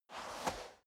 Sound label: step boots sand scuff